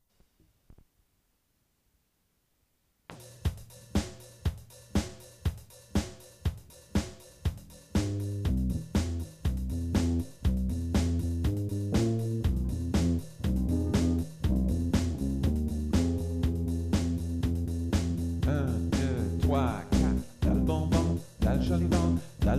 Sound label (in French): v'la le bon vent chorus